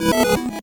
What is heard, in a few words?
8bit se